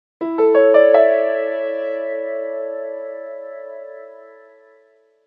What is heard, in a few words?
nokia 8800, ringtones electronic